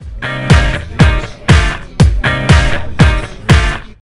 Disco; hotel giant ds; nds

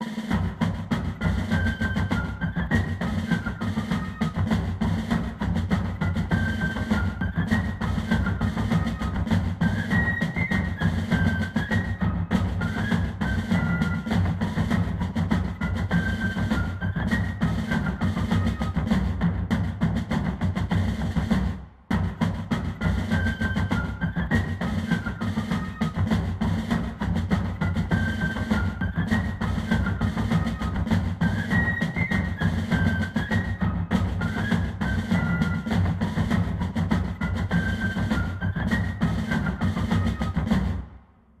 Fanfare Ambience; Assassin's Creed III

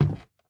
Wood soft

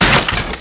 Metal Chain Break; angry birds rio

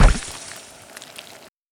Big Slime Column Bullet Hit; A Robot Named Fight

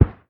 Carpet one; devour 2021 gamerip pc macos

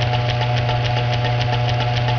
Clicker; In the Line of Duty Firefighter

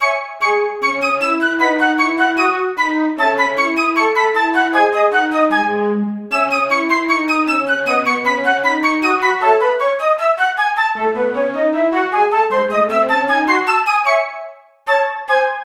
Flute; Rhyme of Duotime BT3

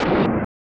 Break

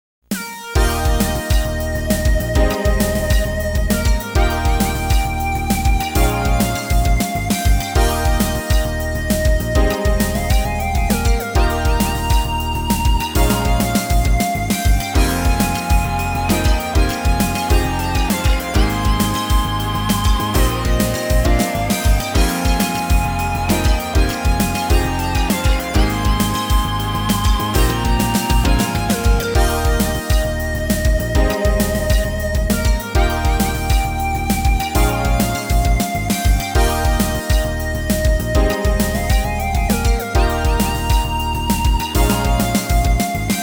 Goal; Dirt Dash; arcade

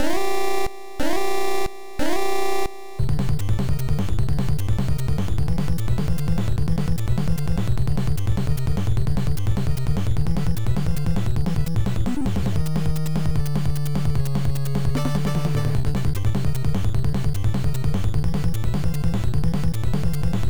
Battle Theme (with Alarm); mission impossible nes gamerip

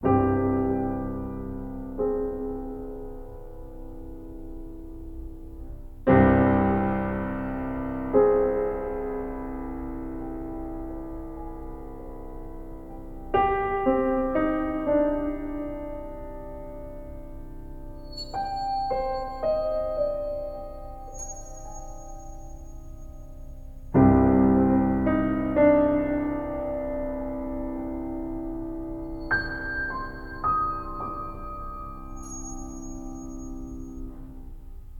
Piano; Identity V

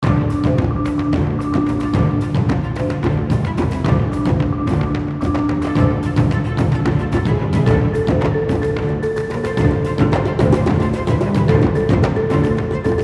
Drums of the Cruel; PixARK

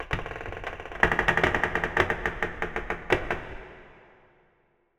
Spook; 1916 der unbekannte krieg gamerip

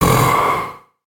pain

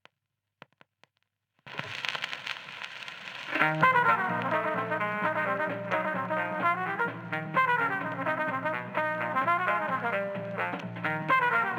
Jazz, Mono

